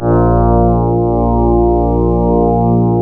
ORGAN, A#; Big Fish Audio; Synclav FM

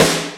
Roland R-8 Snare, Drums

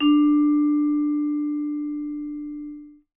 D4, Percussion & Harp; Miroslav Vitous

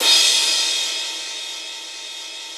Drum Dry; East Collexion; Drum 1

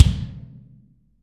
BD, Real Mega Drums

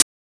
LO-FI CHH, LOFI HATS

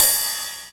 Dry Cym; EMU Xtreme-Lead-1

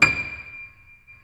STEIN D6, STEINWAY GRD; Greytsounds VOL-1

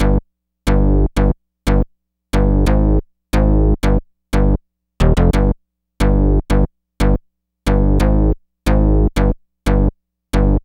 Purple Bass; Basses; Dr. Fink's Funk Factory

